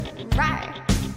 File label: "RAWR"; noises